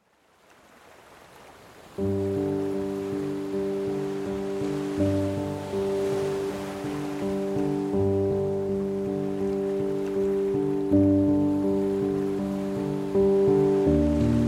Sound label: Piano Motion Ocean Waves; Ocean Meditation; navazmusic